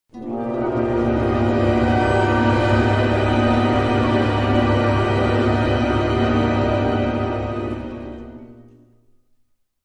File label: ambience telepathy; Knights of the Old Republic II-The Sith Lords